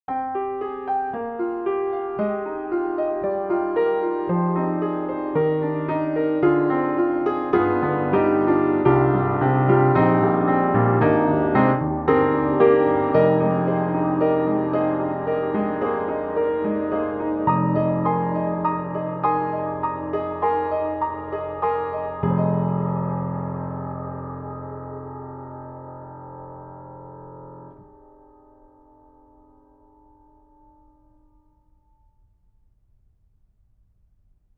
Warm Dark Tail; Soniccouture; The Hammersmith Professional Edition

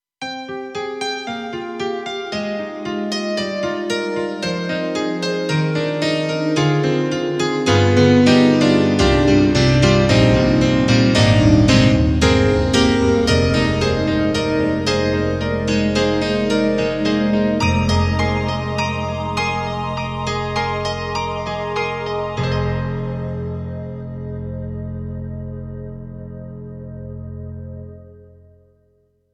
Piano Pad 2; KORG EXB-PCM08; TRITON-Rack

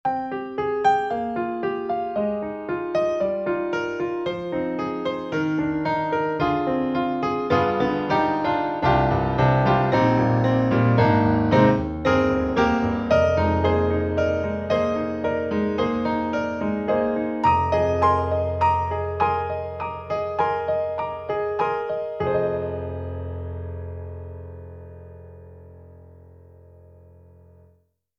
AKAI S3200XL Piano Steinway; East Connexion; Library for S3000

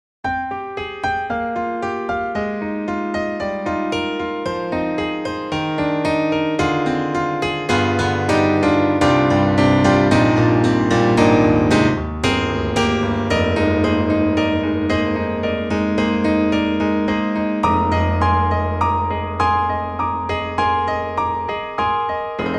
Piano Amber Bright; 4Front; Truepianos

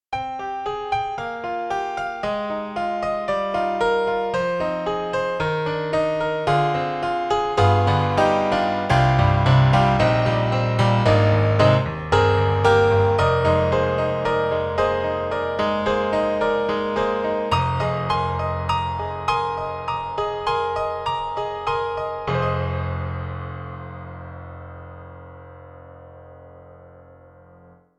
Mini Grand Workstation Piano; AIR Music Technology

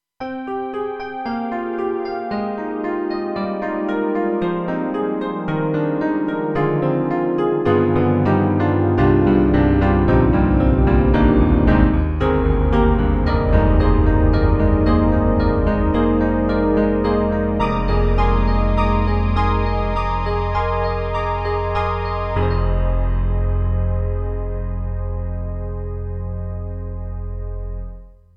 Ballad Piano Stack; KORG M3 XPanded Program

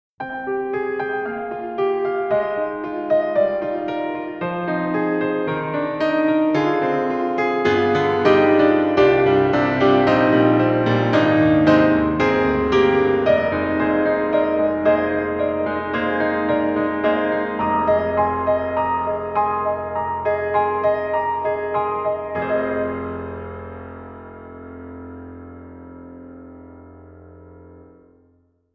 Piano Default Settings + Built-in Reverb; Cinematic Studio Series; Built-in Reverb (100per)

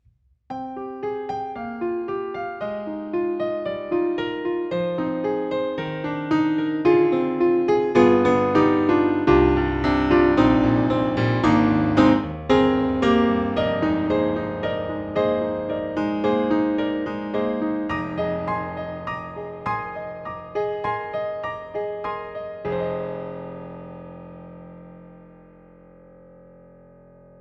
Italian Grand Itallian Concert Grand; Synthogy; Ivory II

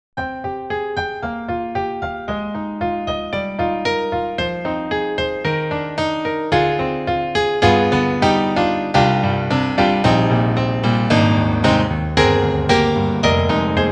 Classical Piano; SD-90; EDIROL; Piano 3

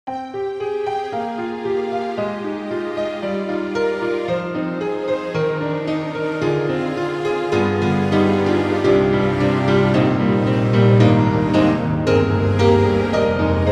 Eagle and Orchestra; Steinberg; HALion 6; HS3 Combis

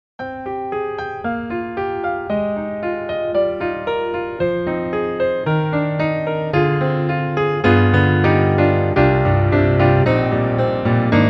Custom C7 Studio; Spectrasonics; Keyscape

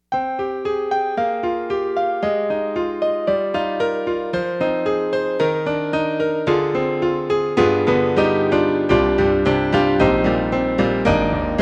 Yamaha TG55 TG503 Grand Piano

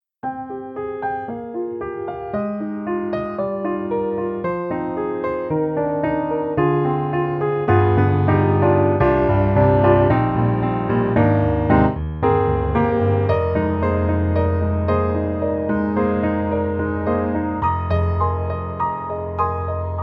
PEARL CONCERT GRAND, Vintage Dark; IMPACT SOUNDWORKS

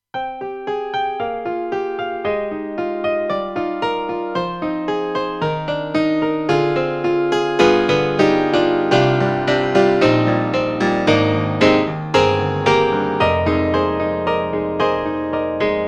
Korg X5DR Brite Piano

